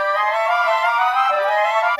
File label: Winds, Orchestral; Sonic Foundry (Sony Creative Software); Orchestral VOL-2; Modern 16